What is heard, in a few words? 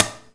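hit on plastic dishes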